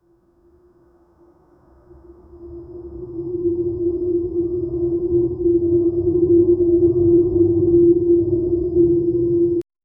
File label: low ambient drone